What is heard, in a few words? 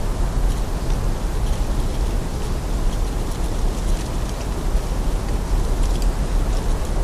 Wind blows through the trees and rustles leaves. Loop. Loop, Grassland Rustling Leaves